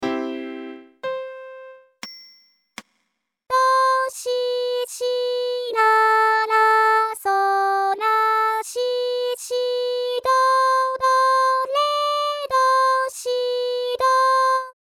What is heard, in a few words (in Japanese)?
ボーカル音声; コールユーブンゲン No.4 d)